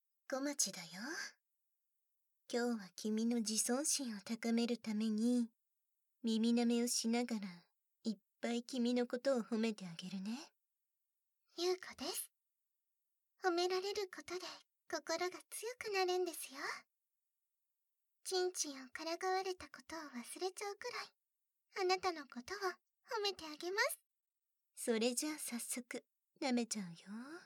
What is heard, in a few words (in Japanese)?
慰め耳舐め; 美人カウンセラーたちの、慰め耳舐め♪